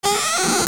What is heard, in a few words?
TOYS